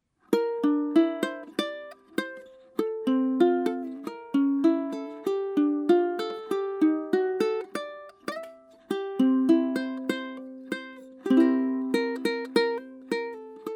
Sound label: Ukulele